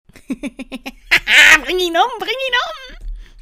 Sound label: laughing german woman